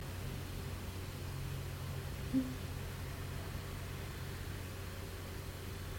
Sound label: "hmm"